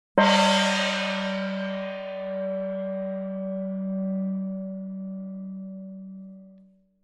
Medium sized gong hit

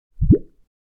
Cartoon bubble

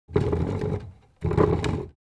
Dragging a chair on the floor scrapes and creaks

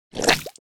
Cartoon splat with bubbles

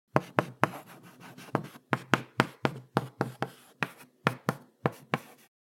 Chalk stick writing on blackboard

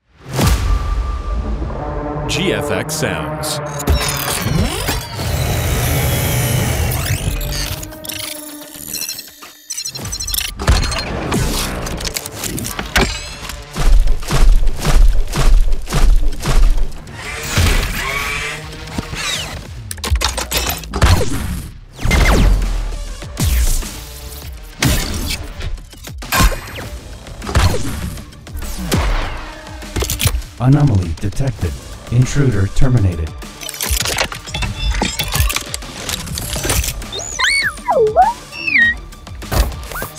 robotic assault

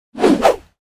Rope swish fast whipping

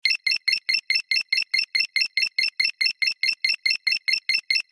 Sci fi atmosphere unauthorized access loopable

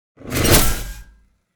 Industrial steam release impact

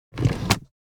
Airplane interior passenger tray table slide; vehicle

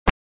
Ketchup bottle squeeze press squirt; cooking

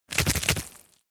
Small creature monster pass by

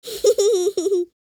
Little boy giggling

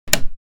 Opening suitcase combination lock

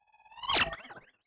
Futuristic swish transition

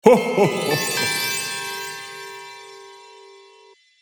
Santa claus ho ho ho laughing christmas; festivities

